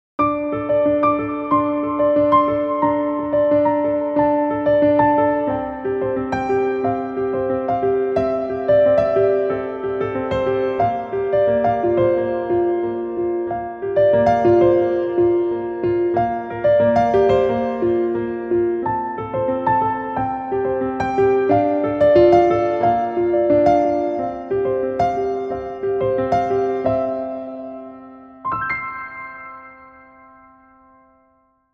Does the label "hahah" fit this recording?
No